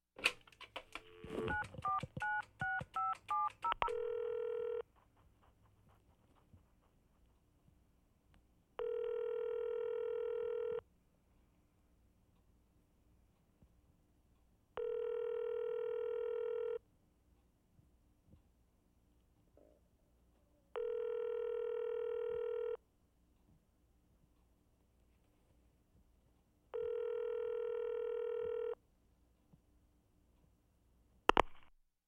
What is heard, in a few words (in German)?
Im Buero Telefonhoerer abnehmen Amtszeichen 5x